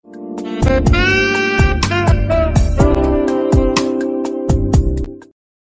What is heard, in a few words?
Chillout S M S